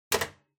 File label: Ceiling Light Pull